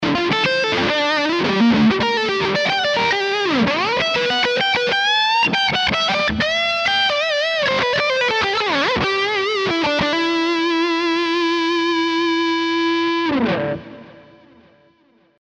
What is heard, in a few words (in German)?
Les Paul Modern Steg Pickup Highgain Lead